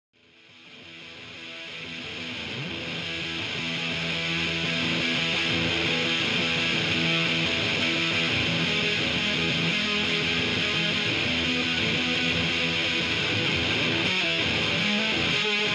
Guitar Extract

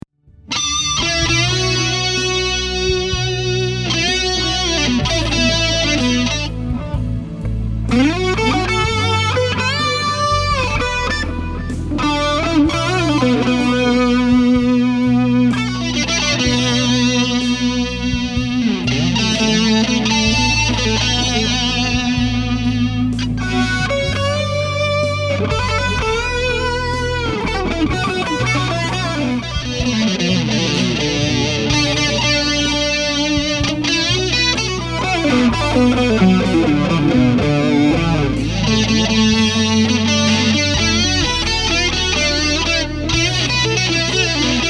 Twin Reverb Comparison

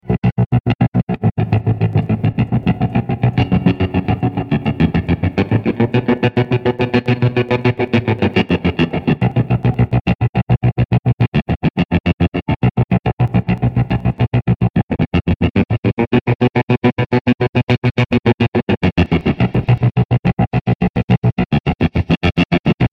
Isolated Vibrato Section

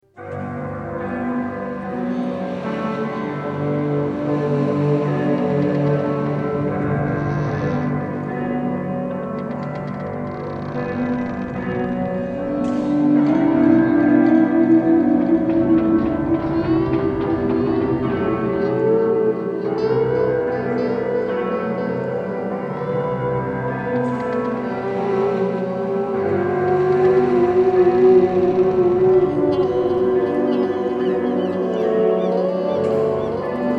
floaty guitar stuff

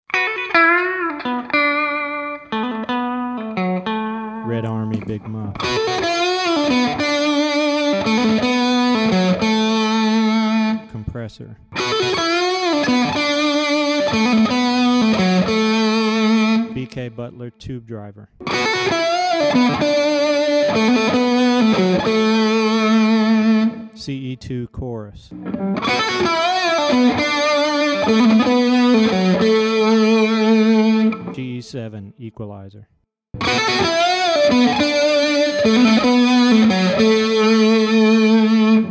TONE BUILD W VINTAGE PUPS SOLO

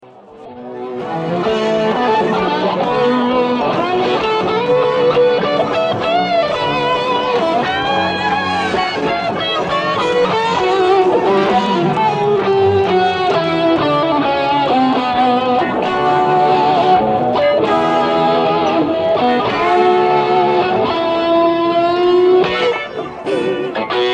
Isolated Guitar; Torino 1994

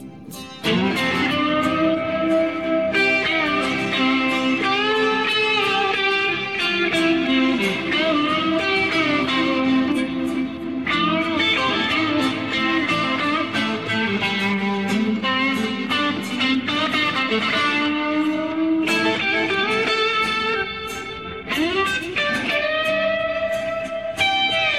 isolated guitar solos; Earls Court 1994

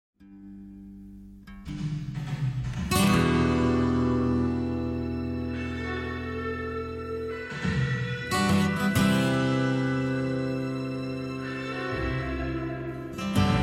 Solo Reverb Room Mix

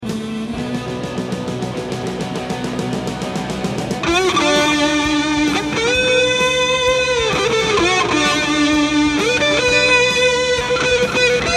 Twin Reverb, High Volume, Big Muff

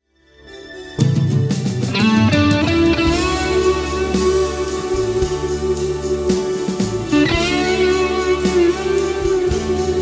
Solo

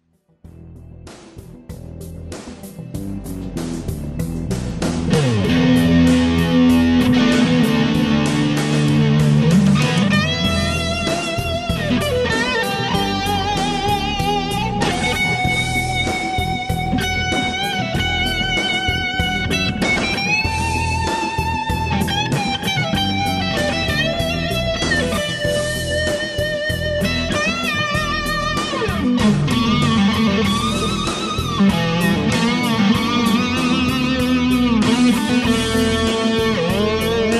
solo

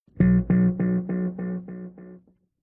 Bass Guitar Intro Note

